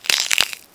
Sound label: Bone Crack